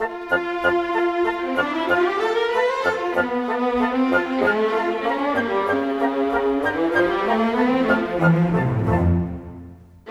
Orchestra; Sonic Foundry (Sony Creative Software); Orchestral VOL-2; Modern

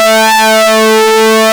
SYNCSWEEP A, ARP Synths; E-MU Formula 4000 Series Vol. 3; Analog Odyssey; ARP Synths II

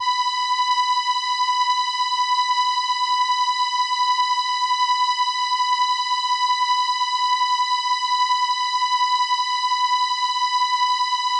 Trumpet B6, ARP Solina

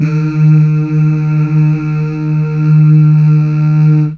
Mmh Male, CH BACK

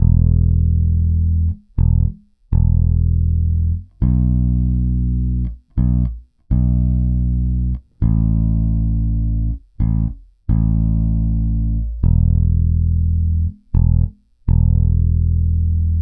Pop, Bass

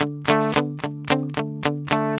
rock D 110, Vintage Blues Guitar; USB Soundscan vol.22; AKAI; ROCK2

